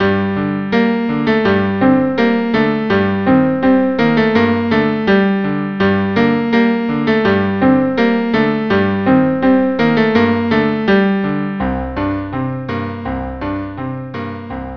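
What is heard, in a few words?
cello cello; Anah Halach Dodeich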